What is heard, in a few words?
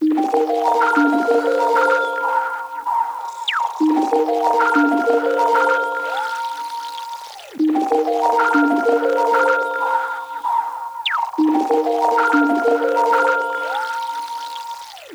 Melody Loop Keytracked